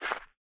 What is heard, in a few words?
Snow Ball Floor; MiSide